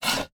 Wood Chair Slide Across Wood Floor Quick, Household